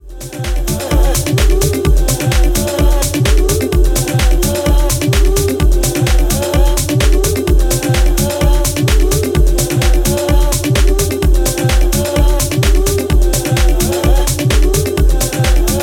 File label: Scream